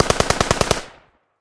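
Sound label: machine gun sounds; zvuki avtomata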